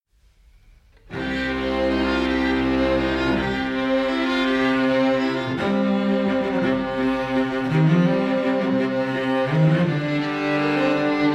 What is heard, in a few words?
String Quartet; Manchester String Quartet; Many Strings Quartet